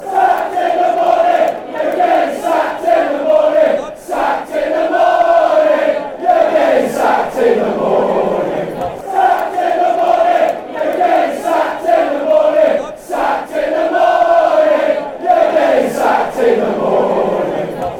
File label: sacked in the morning fanchants